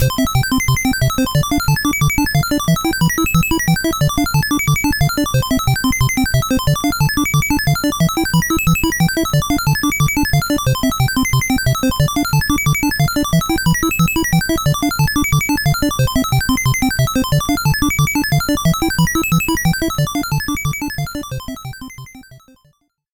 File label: Bonus Stage; Bubble Bobble